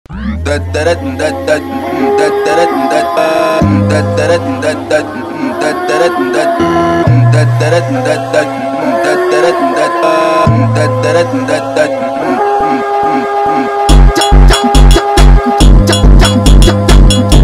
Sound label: phonk